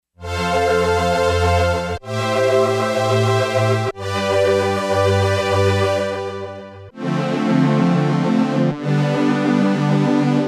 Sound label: Analog Str